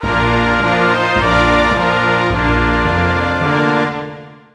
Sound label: Ireland, Fanfare; 1997 MMX LAN PACK (win 95); Worms Armageddon